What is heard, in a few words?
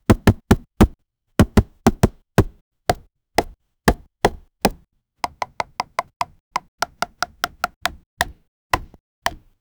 plastic pieces hitting a